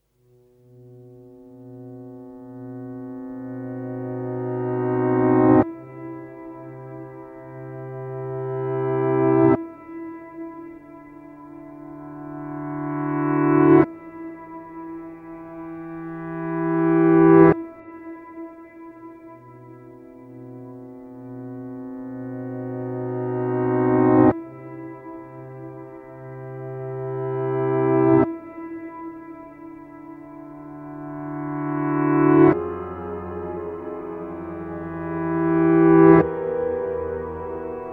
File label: Piano